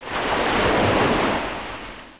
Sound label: wave, water